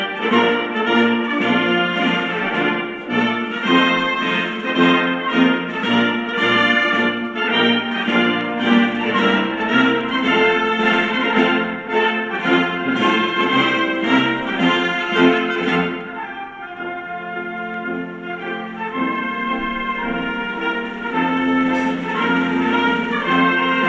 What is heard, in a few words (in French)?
HYMNE NATIONAL BELGE; foot bergerac le puy foot 43 avant match